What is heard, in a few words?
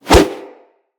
Brawl Whoosh; Characters; Brawling